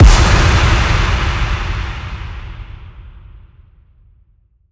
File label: CHIME FX impact blast; M8